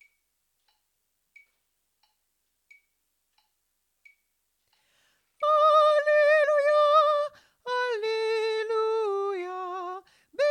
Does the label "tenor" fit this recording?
Yes